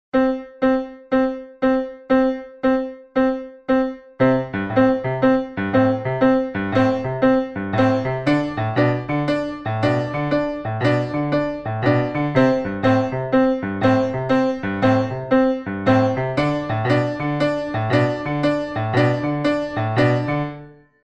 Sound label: metronome